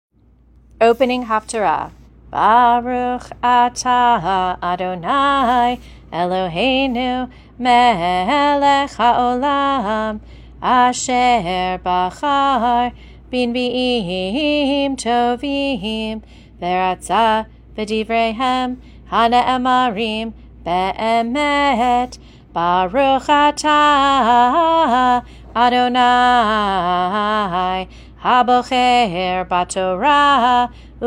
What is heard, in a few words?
Opening Haftarah blessing; bnai mitzvah; barbat mitzvah